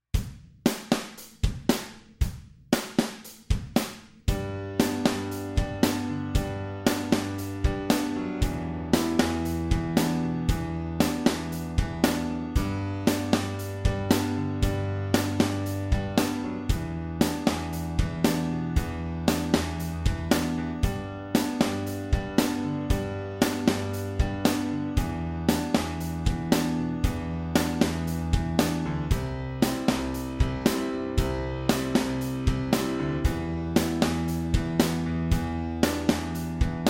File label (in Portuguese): Playback Rock em Tres